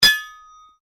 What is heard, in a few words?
Strike Metal On Metal Heavy Ring; Foley sounds for melee weapons; Metal Sound Effects; Materials